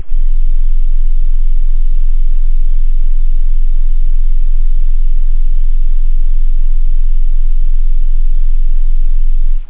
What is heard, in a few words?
sub base, bass, synth; da capo vol1